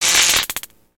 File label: spark; spaceship